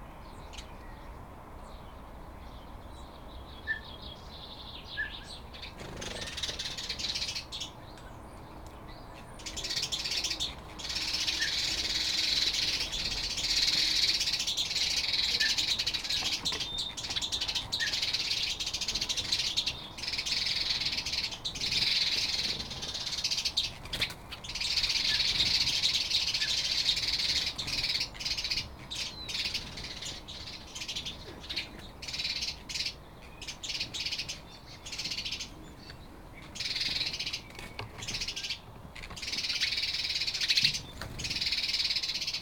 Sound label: House sparrows chattering